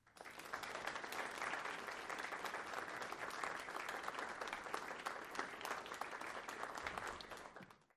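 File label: Crowd 30 Steady Polite, small interior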